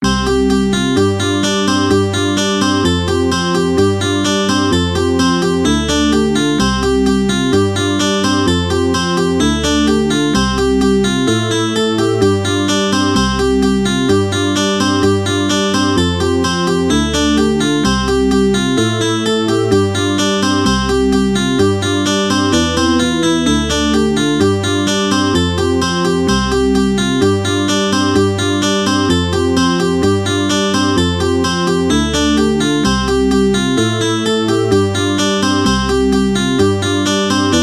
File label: Twinkle Recursive